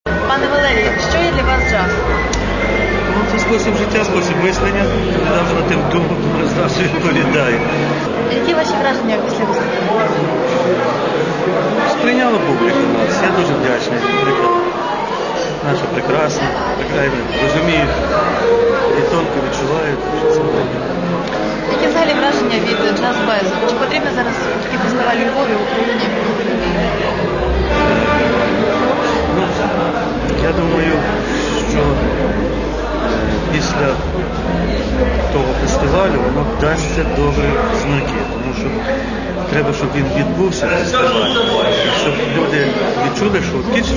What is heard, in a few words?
piano